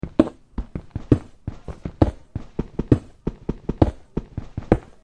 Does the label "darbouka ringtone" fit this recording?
No